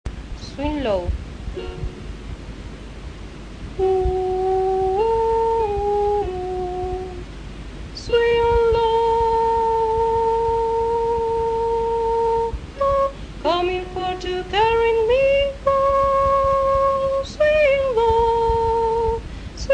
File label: Swing Low - Sopranos; Swing Low, Sweet Chariot